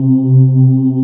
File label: VOICES, Roland JD8000; Sound & Vision - Gigapack I CD 2